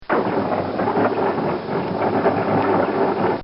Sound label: Cartoon Sound Effects Grumble